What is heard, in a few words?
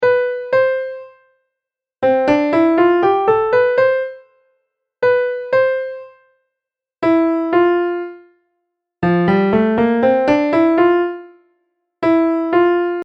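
minor 2nd Scale Example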